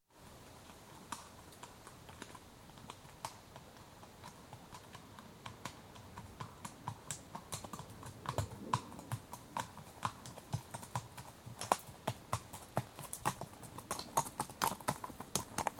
One horse approaches at walk, fidgets on mic, departs at walk on rough track, left to right, aircraft heard briefly in; Horses Walking; BBC